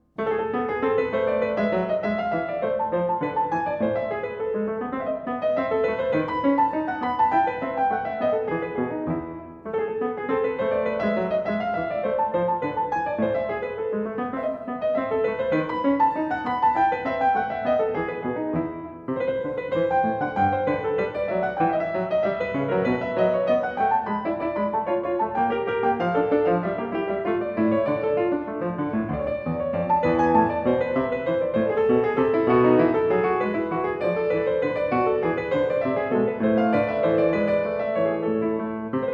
Aria variata alla maniera italiana, in A Minor Variatio IV. Allegro; Bach; Ashkenazy; BWV 989